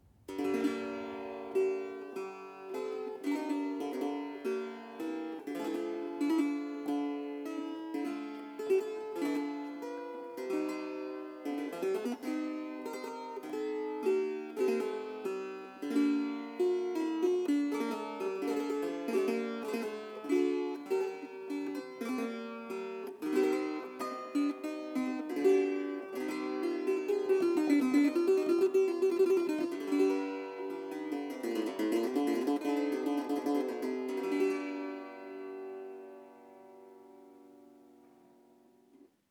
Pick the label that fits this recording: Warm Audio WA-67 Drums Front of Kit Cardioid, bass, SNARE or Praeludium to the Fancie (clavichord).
Praeludium to the Fancie (clavichord)